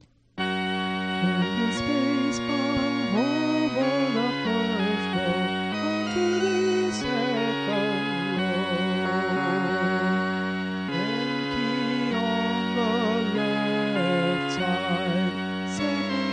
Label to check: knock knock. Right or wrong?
Wrong